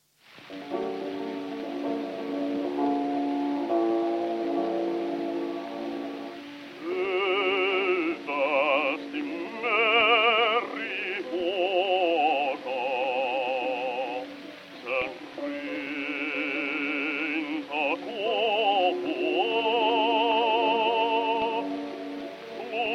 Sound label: Gramophone